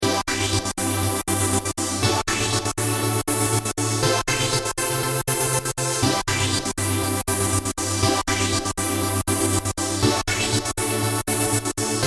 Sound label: trancegate stereo